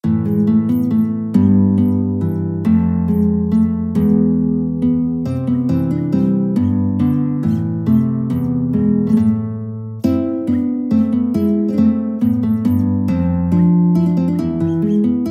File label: minuet, guitar; purcell